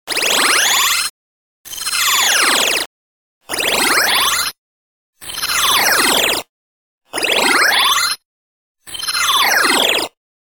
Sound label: Cartoon Siren Whistle; Soundscalpel Com